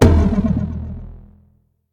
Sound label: taiko high; smite; raijin